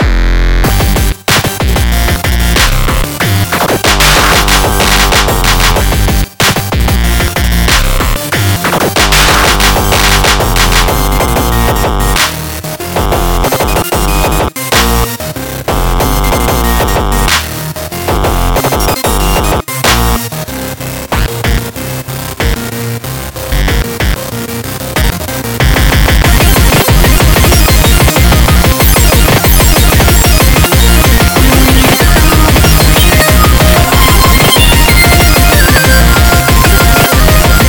Supersaw Hoover quickdrop

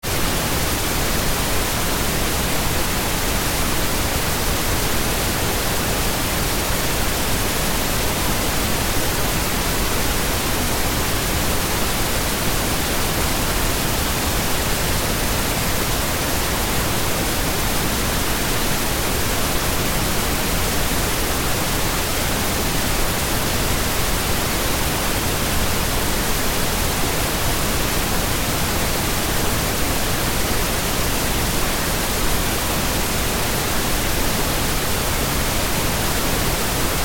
Pink Noise Right; 808

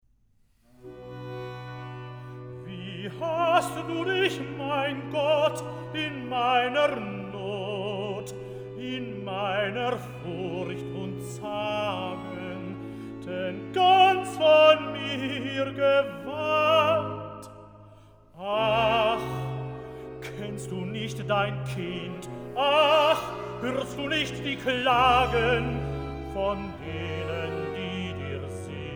Cantata BWV21 Tenor Recitative; Ich Hatte Viel Bekummernis; Wie Hast Du Dich, Mein Gott; Johann Sebastian Bach

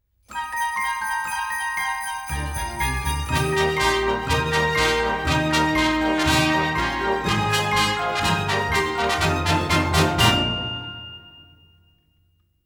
Jingle Bells; Pukey Jingle Bells Sweetener

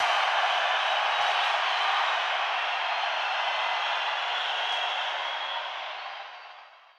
Crowd noise; 1998-07-15 Wings Stadium, Kalamazoo, MI, USA

